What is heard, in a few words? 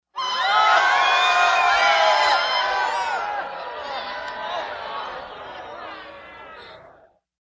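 Theater Crowd Startled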